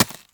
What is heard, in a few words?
ice dig; Too Many Stones